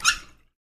Faucet Squeak, water

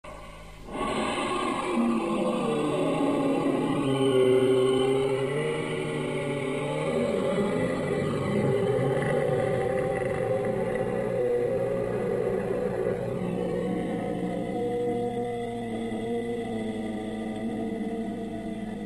Alien Breathe Large; breathing

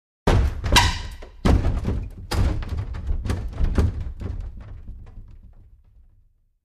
Large Chest Dropped

